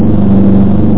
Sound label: Motor; Krusha